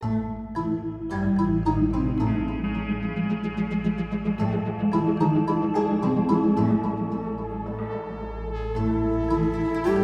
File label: Undead Elemental Gate; Skylanders Giants